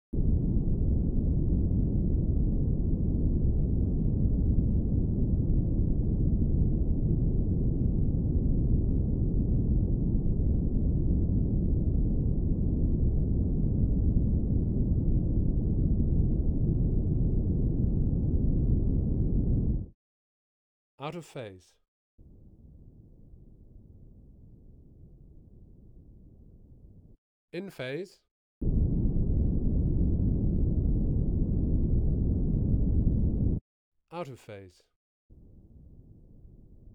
Pink noise bands and phase check, Bass 0 220 Hz & phase check